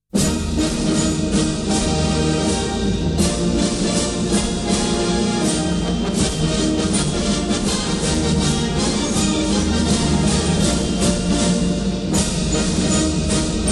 GER WAR Prasentiermarsch

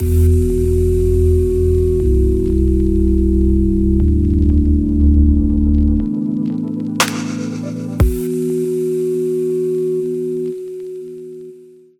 Walk Without Rhythm Medium

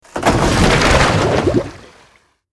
Ship Sink; Character Ship Sink

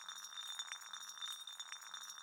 Orb Moving (roll); Munin

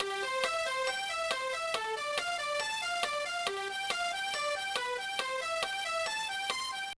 Round Piano

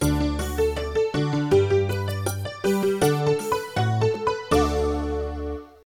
Success

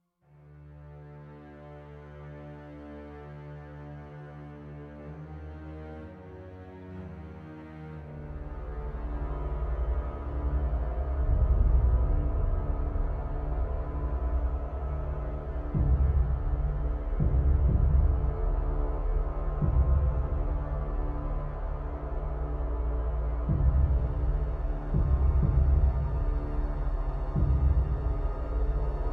String

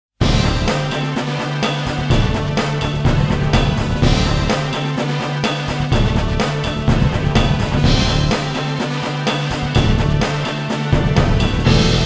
Ending BGM

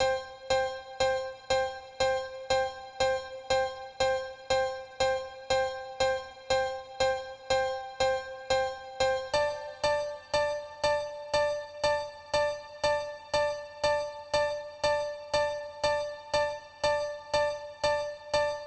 Dance Piano; super crush k.o.

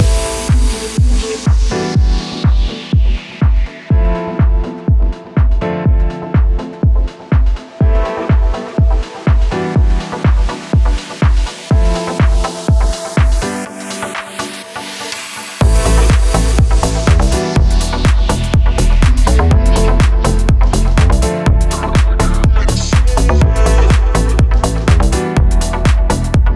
Upbeat Deephouse Loopable; Lost Ruins

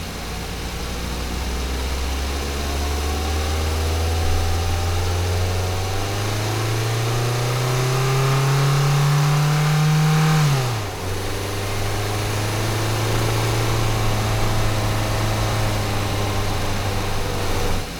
vehicle small drive

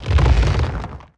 Rock Crack Deep

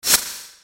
(SFX) Whoosh

